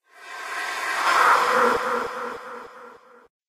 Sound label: void blink; Magic; Eldritch